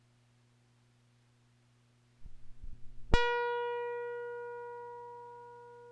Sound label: guitar string